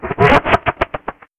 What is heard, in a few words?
laugh; itsteals; legs; slender